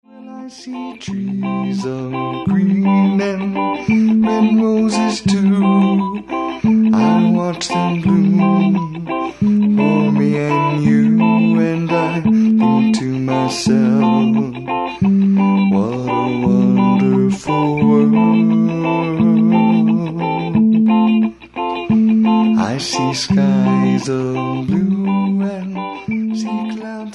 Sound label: uke